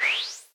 Appear; New The Denpa Men

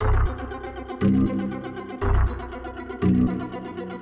Game Over Music Loop; Night of the Consumers

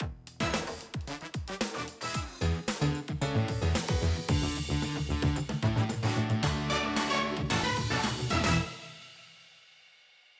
Game Start; Nintendo Switch Sports Online Play Test

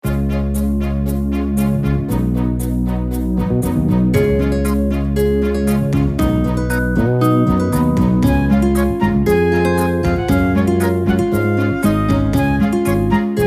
WORLDMAP

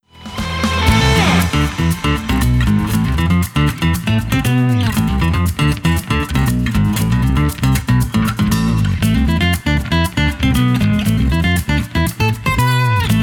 Flamenco; super crazy rhythm castle

